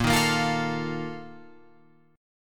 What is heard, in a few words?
A sharp Major 7th Suspended 4th, Guitar Open F; strummed